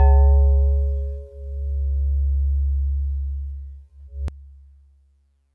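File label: DX100 C sharp 2; David Lynch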